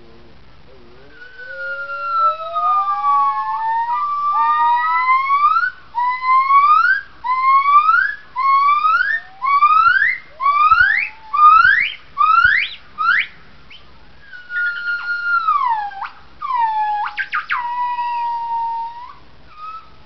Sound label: yellow cheeked crested gibbon; Vuon den ma vang